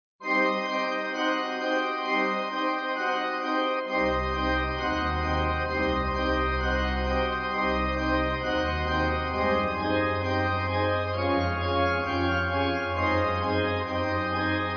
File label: Toccata Gothique Pipe; Boellmann